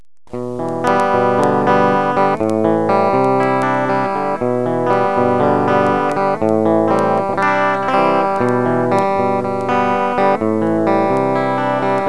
my acoustic guitarwork; my grant impersonation

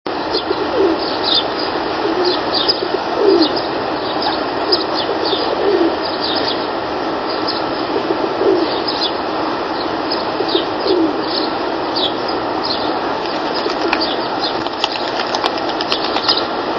rock dove with flying; birdsongs

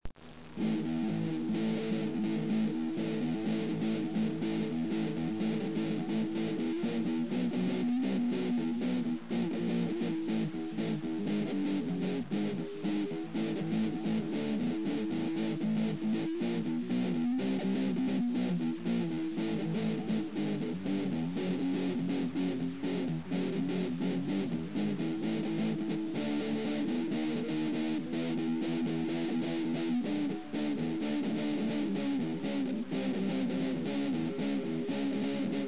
guitar

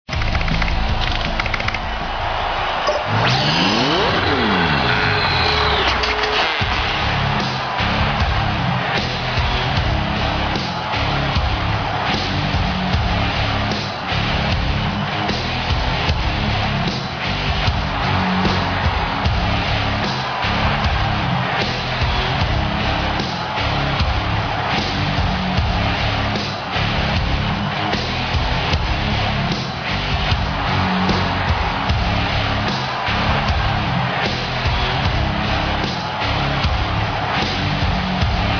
crash

